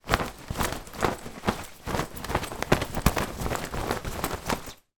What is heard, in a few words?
clothing bodywarmer gilet shake; zapsplat